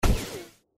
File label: Boom; Naruto